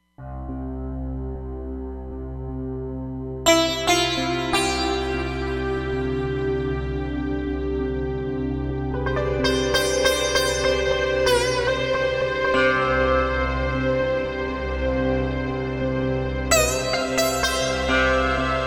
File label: Sitar, Roland Fantom XR